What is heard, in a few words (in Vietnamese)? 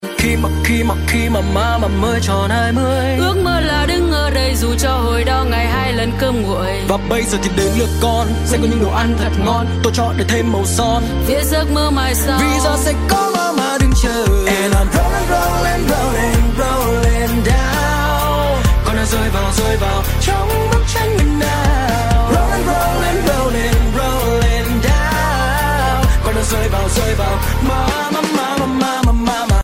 nhac chuong tiktok